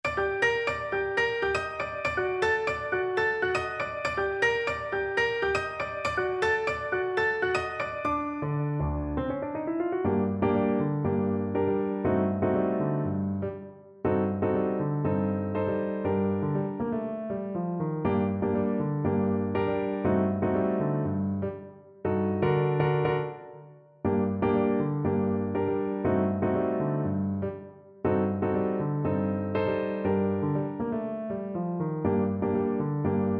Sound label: hot sauce salsa, Trombone